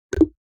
High Temperature Alarm; China Telecom

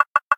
Click

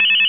connect tone; Samsung SCH-M710 T Omnia II

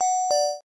camera shutter dingdong; Samsung SGH-i607 BlackJack

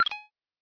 Video Record end

